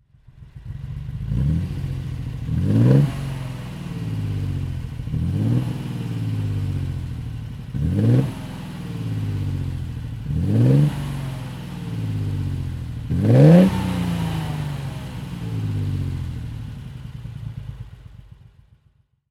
Lancia Flaminia Super Sport Zagato im Stand

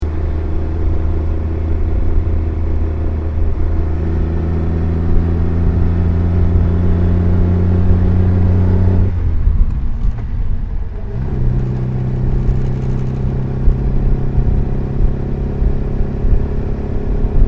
Singer Nine Le Mans in Fahrt; Le Mans Renngeschichte; Singer Nine Le Mans Vorreiter

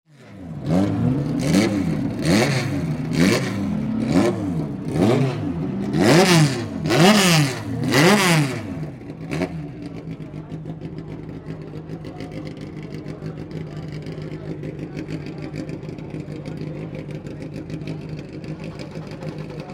Warmlaufphase, heisse Rennwagen; Oerlikon 2016; offenen Rennbahn in Oerlikon; Bereits zum 14. Mal wurde auf der offenen Rennbahn in Oerlikon, einem früheren Vorort von

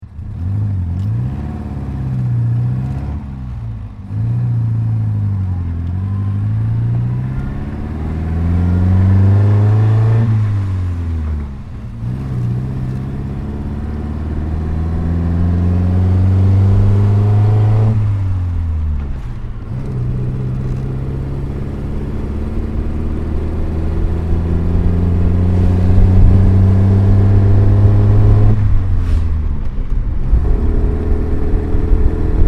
Singer Nine Le Mans 1935 Beschleunigung; Le Mans Renngeschichte; Singer Nine Le Mans Vorreiter

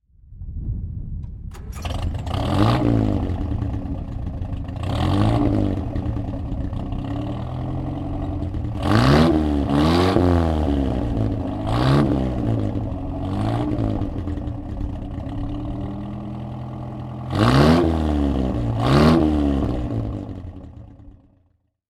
Riley Kestrel Starten

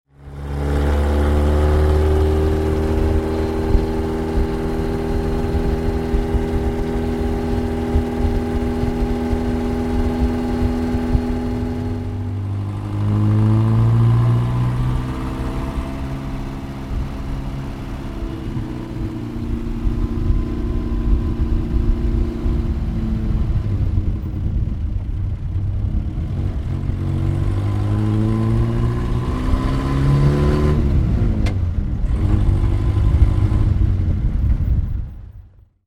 Riley Kestrel unterwegs

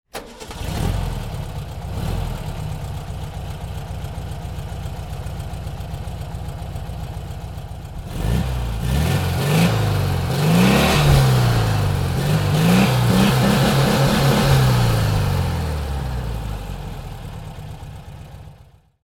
VW 1200 - Starten und Laufgeraeusch; Luxe 1961

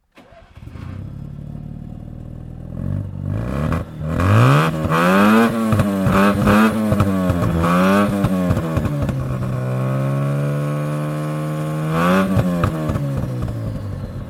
NSU Ro 80 - Starten

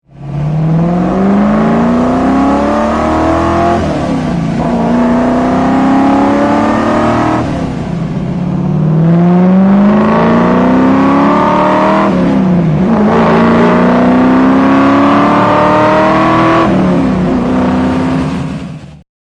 Shelby GT 500 - Innengeraeusch; Springende Mustangs Shelby Bullit und Eleanor

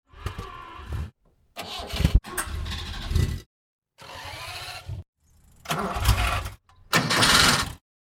Anlasser Geraeusche

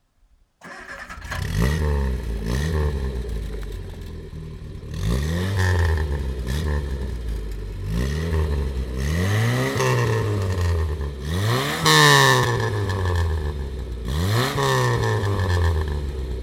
Triumph Herald 13-60 starten

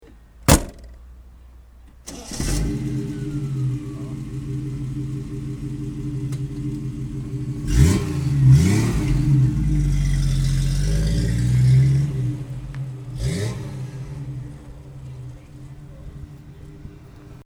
TVR 2500 M Warmstart

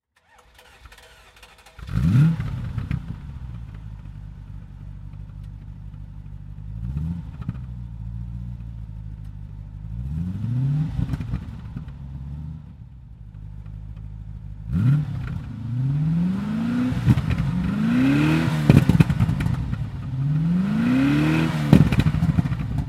Aston Martin Lagonda aussen

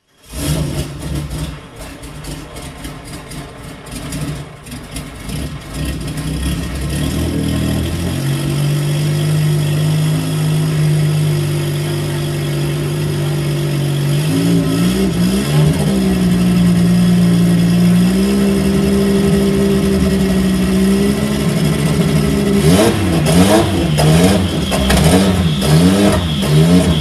Porsche WSC, Motorgeräusch des Porsche WSC Spyder; Motorgeräusch des Porsche WSC Spyder (1998) an der Porsche Sound-Nacht 2014

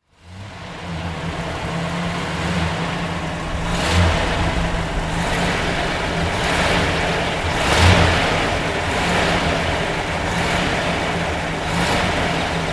Buick Eight vorne; Schweizer Wertarbeit aus den Dreissigerjahren

